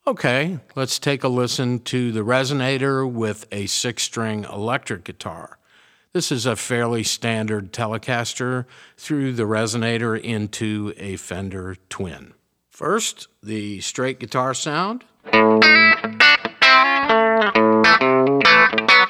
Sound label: resonator pedal, steel guitar